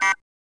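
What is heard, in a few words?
item countdown loop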